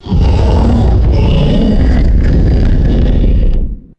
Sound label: deep wet reverberant creature growl